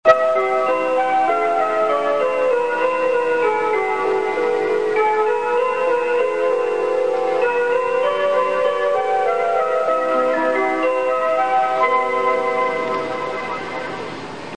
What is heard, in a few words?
bus chime; 会津バス; 高速バスの起終点や途中休憩ではチャイム１〜３が流れ